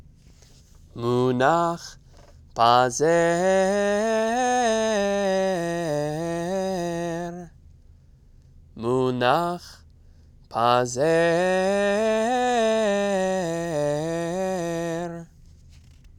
pazer, trope; Trope Families

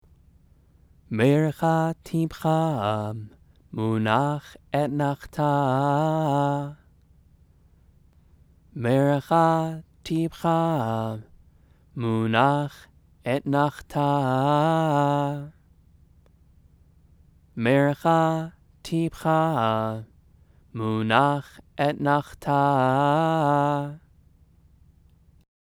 megillah; trope

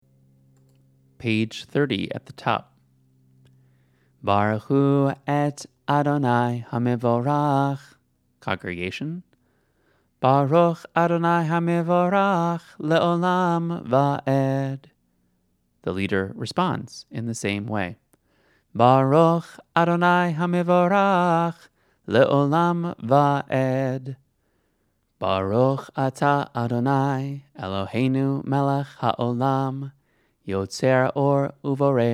barchu and blessing

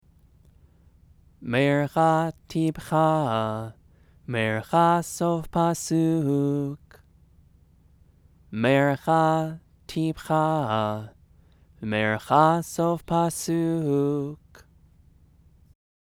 megillah; trope

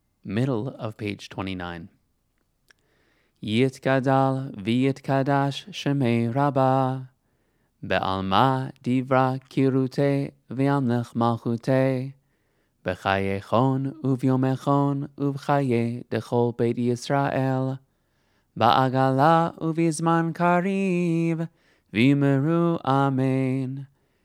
hatzi kaddish

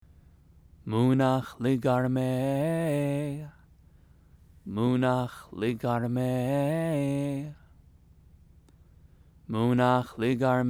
megillah; trope

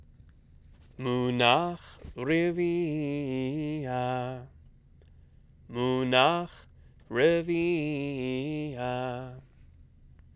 revia, trope; Trope Families

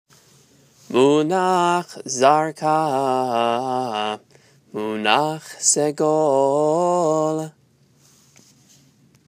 segol torah; trope

